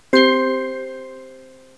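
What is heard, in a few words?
bell chord; Presentation Partner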